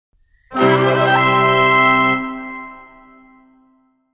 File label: winner; diogenes gaming mines